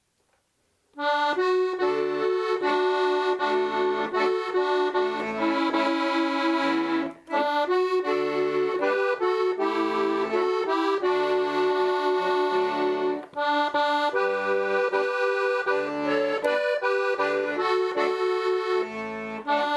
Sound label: Instrumental; Wahre Freundschaft